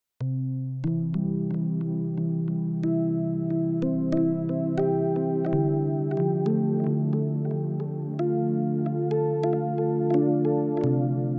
synth tape delay; valhalladelay

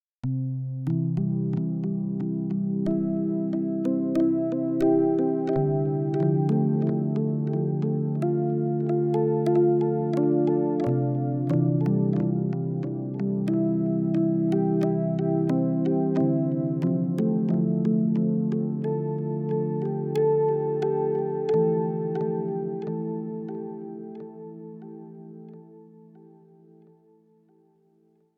synth delay digital; valhalladelay

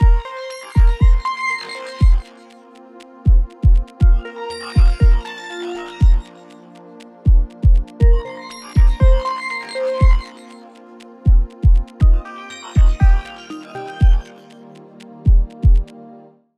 synth delay; timeless 3

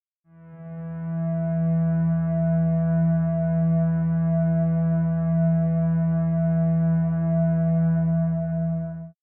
delay pad; aas objeq

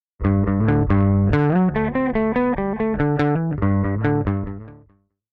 hornet dyneq resonance with

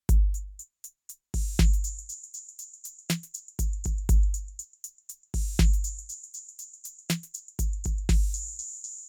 delay beat; aas objeq

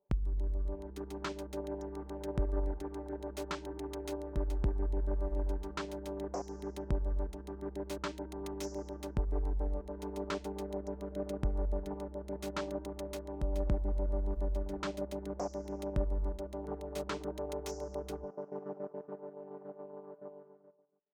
pad strong mod

